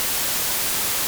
Thrush; افکت صوتی

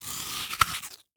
biting apple; افکت صوتی

